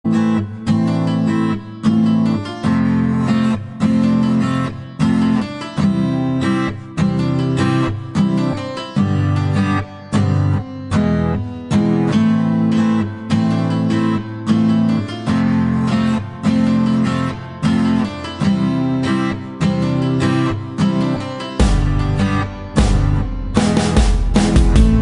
Sad Love Guitar

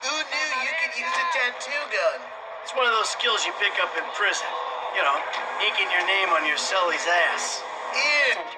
Tattoo gun

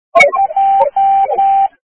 Morse

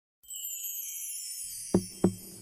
Chimes Knock

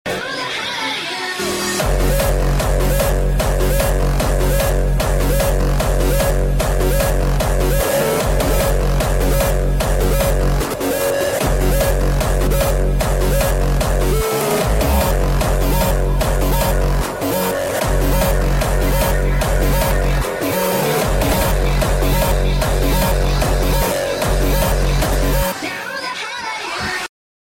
HARD STYLE

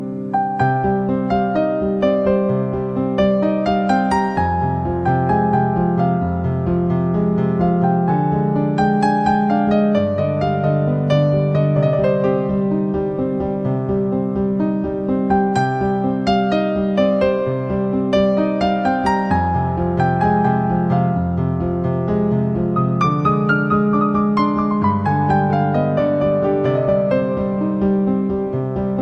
Bautiful Piano

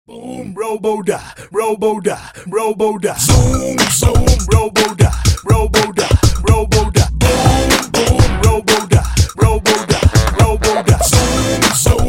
Robot Boom

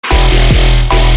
Dubstep notification

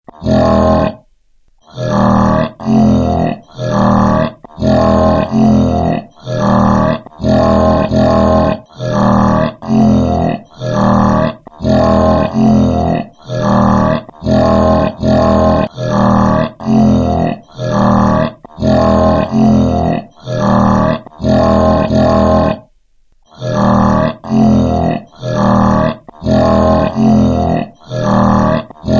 Monster Sound

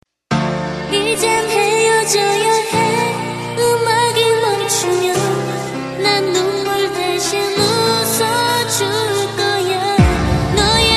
Cute Korea Ringtone

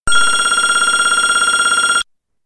Ring Tone

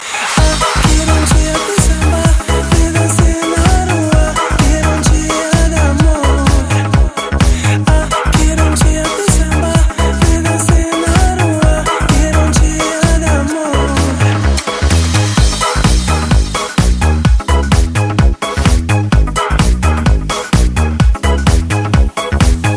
House Mix

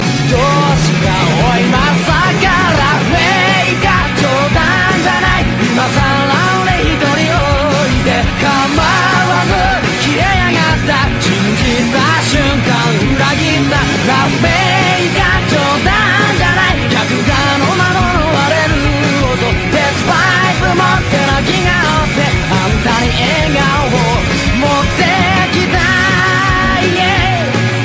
Laugh Maker

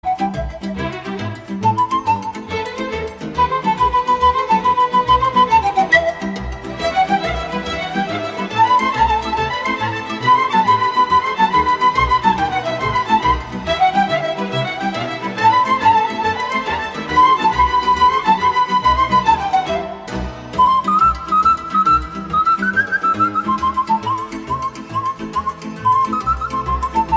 Instrumental Sarba

